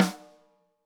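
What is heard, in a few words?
snare drum, Drums; Bob Clearmountain Drums I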